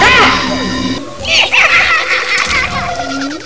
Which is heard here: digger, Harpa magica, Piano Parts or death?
death